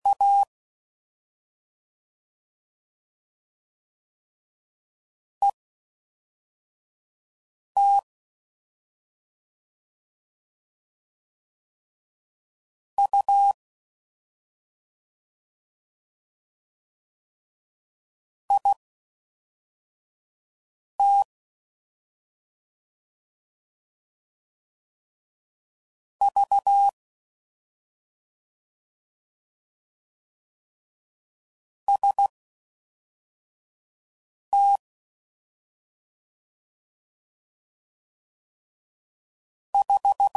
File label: CW Comparisons Broken Characters, CW Code Groups 5WPM; Morse Code; HamRadio; Mixed